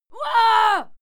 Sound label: female fight scream hua; schreie; menschen